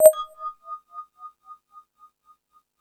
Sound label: Multi FX, Drum Machines, E-MU Pro-Cussion